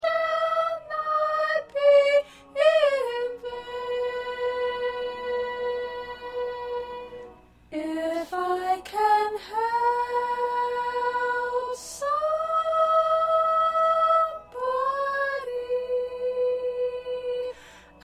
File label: 1st Soprano